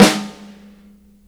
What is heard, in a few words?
SD, korg drums